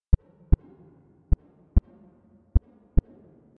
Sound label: Heartbeat